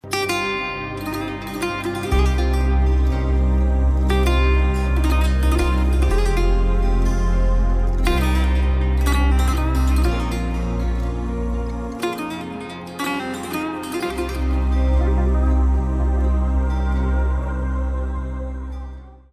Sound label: Shahrzad Ringtone